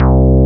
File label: JUNO, Synth Bass, Analog Bass